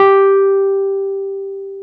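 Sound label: piano, Wurly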